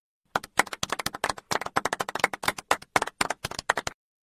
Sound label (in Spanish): aplausos sonido